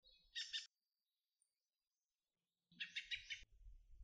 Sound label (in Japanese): tsugumi jinaki